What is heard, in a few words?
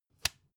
cd cover close short